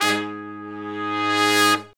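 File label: BRS longs, R&R horns section; Roland L-CDX-03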